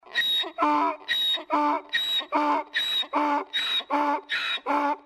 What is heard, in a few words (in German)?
Esel